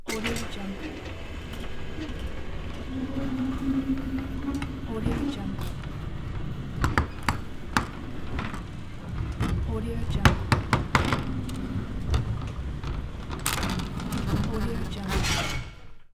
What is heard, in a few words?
big industrial sectional doors open